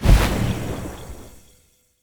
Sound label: Soul Steal Activate; Powers